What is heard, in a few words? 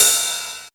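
MPC overhead, drum kit; USB Soundscan vol.03 - Pure Electro; AKAI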